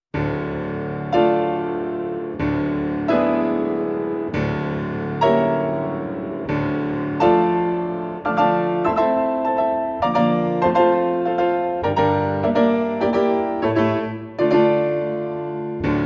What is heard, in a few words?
Etude in D Minor; op 45, no 15; Stephen Heller; Celebrate Theory recordings